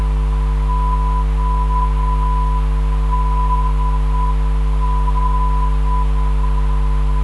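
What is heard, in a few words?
372kHz, non-directional beacon; ODR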